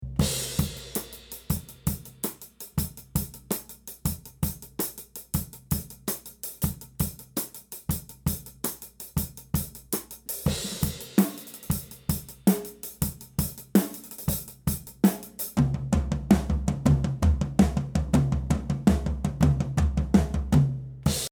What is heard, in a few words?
Section A OH and Side